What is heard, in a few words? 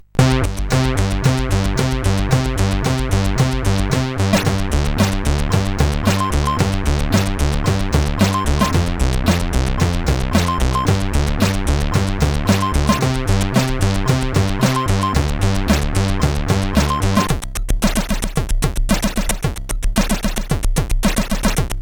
Spectrum tune CSG8580R5